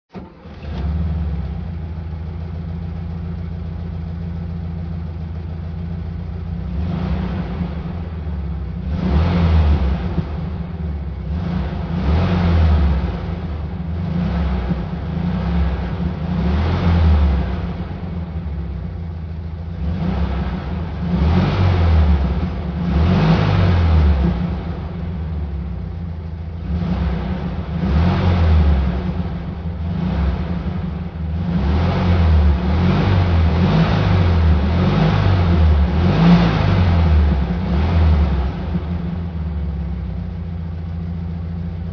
vw start stop

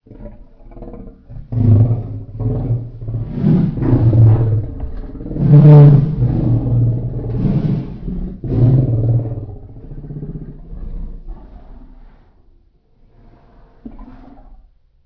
lions

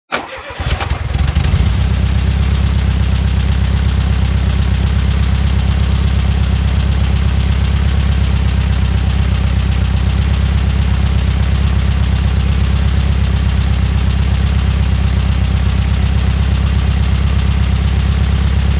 vw start idle

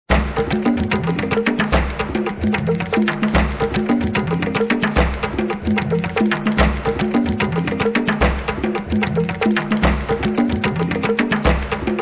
tribal

